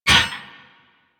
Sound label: Melee Impact Metal; Warframe; Weapons